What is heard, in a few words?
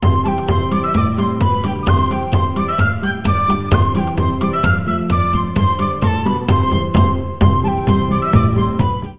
ringer; Ringtones